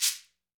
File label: SHEKERE, Latin Shakers